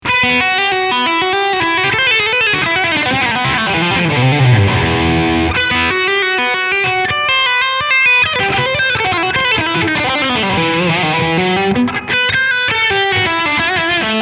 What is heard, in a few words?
Overdrive Lick Delay